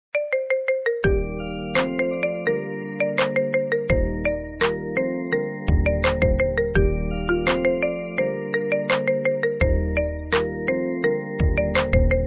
marimba cover marimba ringtone

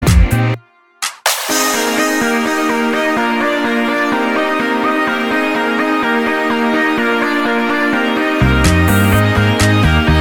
pop instrument

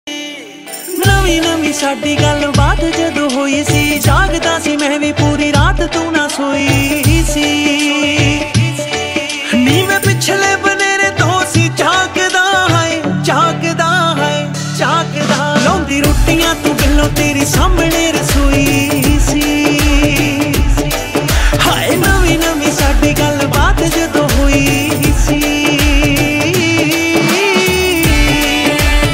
punjabi song ringtone

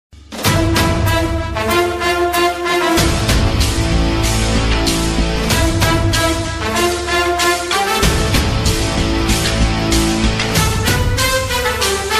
tamil bgm ringtone; bigil rayappan

